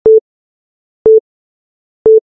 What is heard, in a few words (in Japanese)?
時報サイン波（最初のピ・ピ・ピ）